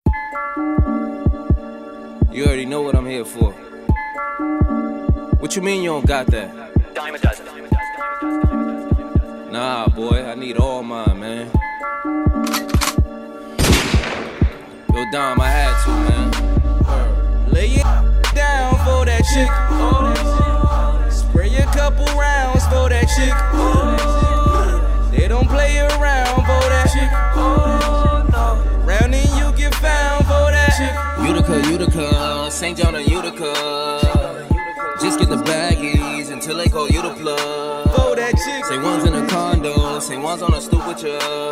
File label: Hip Hop Mixdown